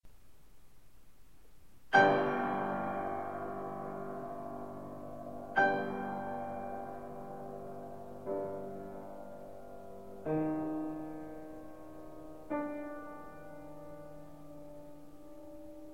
Circle of Fifths; Albumleaf 3

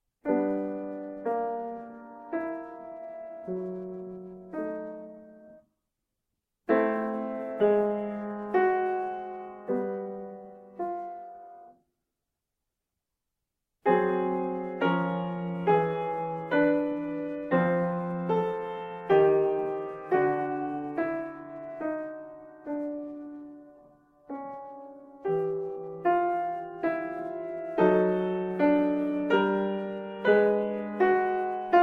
Three part Chromatic; Albumleaf 52

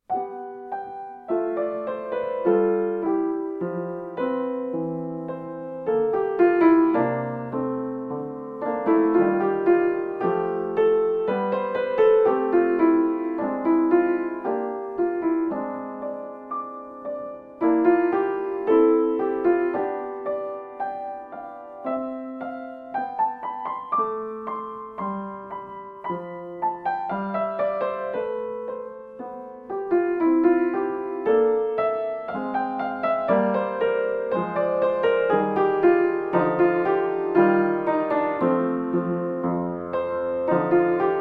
Piano dolce

